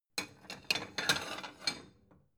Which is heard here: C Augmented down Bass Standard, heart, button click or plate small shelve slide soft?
plate small shelve slide soft